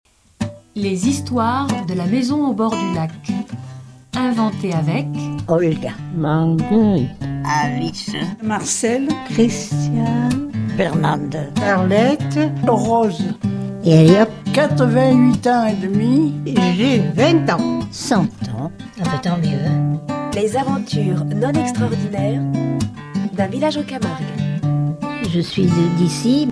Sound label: JINGLE